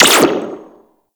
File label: Sentinel Laser Rifle Fire; Warframe